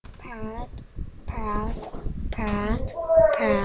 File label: pad